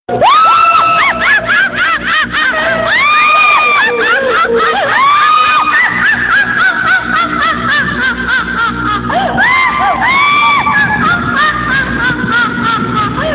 Witch Laughing

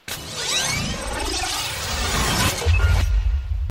High Tech

